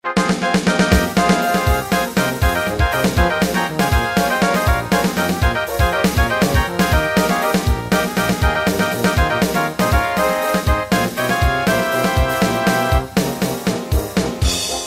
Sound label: midi